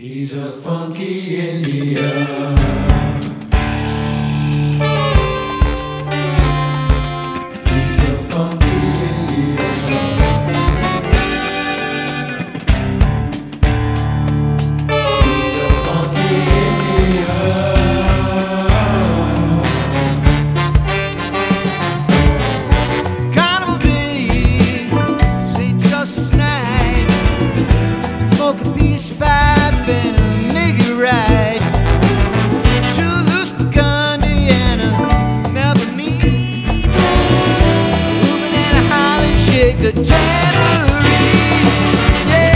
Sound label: Funky Indian Snippet